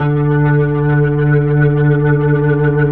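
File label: 2D L, Slow Fast Hammond, Organs; E-MU Producer Series Vol. 5 – 3-D Audio Collection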